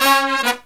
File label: Killer Brass Riffs, 108 soul